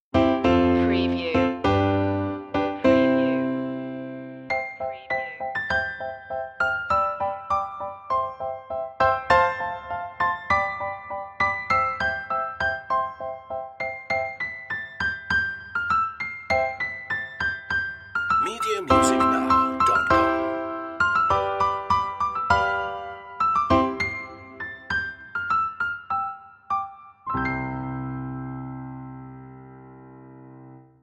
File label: piano; joy to the world; media music now